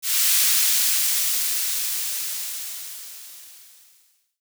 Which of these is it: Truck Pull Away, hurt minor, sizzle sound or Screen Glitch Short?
sizzle sound